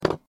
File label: Drop Slingshot